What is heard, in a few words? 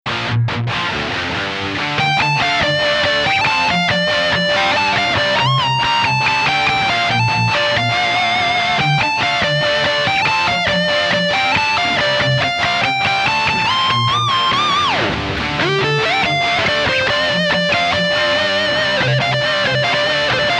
Rockerverb Dirty Gibson SG; Rockerverb 100 MkIIH